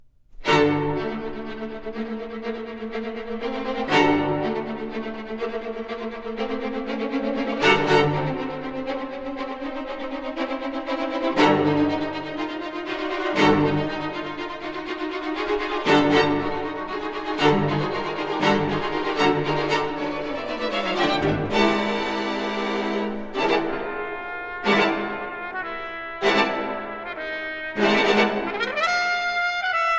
Concerto for Trumpet and String Orchestra

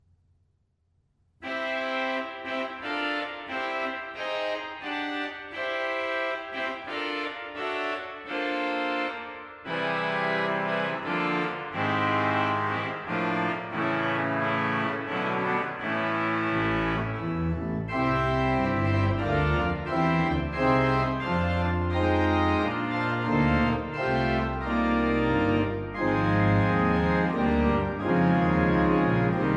Fanfare

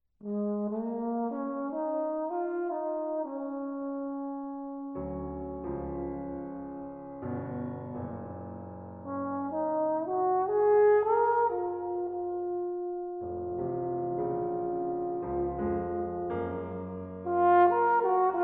for Trombone and Piano

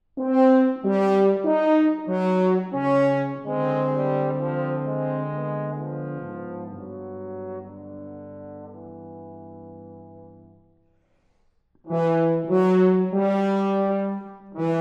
Triangles For Horn, Trombone and Tuba

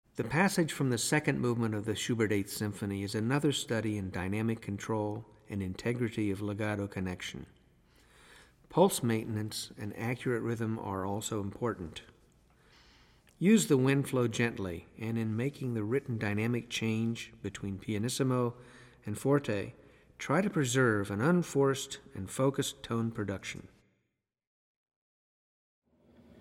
Symphony In B Minor, Clarinet; Symphony #8; Unfinished

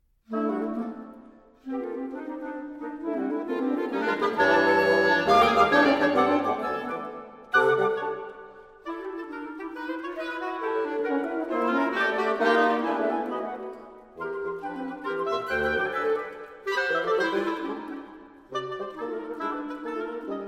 Woodwind Quintet, Fiery

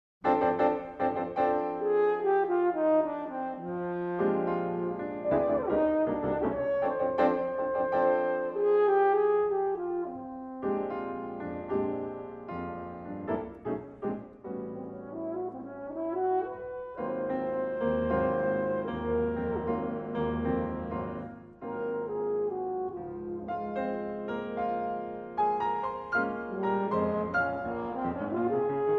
Suite For Horn And Piano, Suitable For Dancing

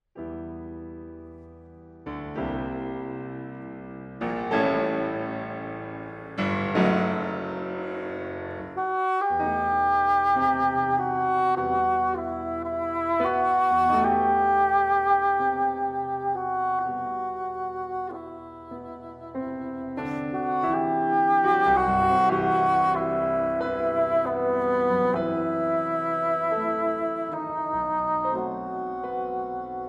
Portuguesa For Bassoon & Piano

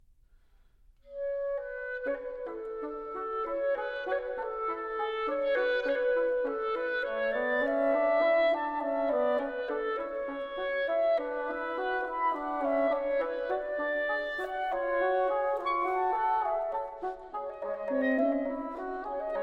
Quintet, Menuet, Woodwind Quintet

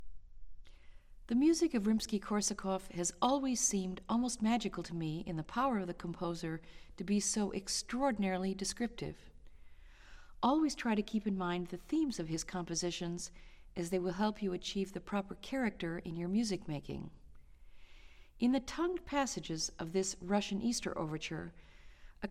Orchestral Excerpts For Flute; Russian Easter Overture

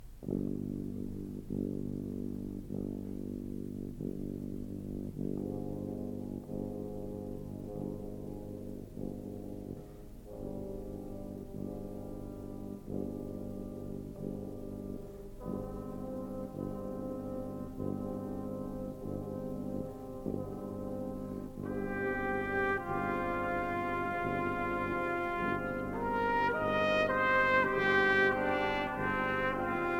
Sonatine for brass quintet

